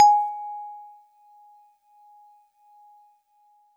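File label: Celeste DX100 G#1; Pristine Celeste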